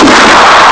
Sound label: explo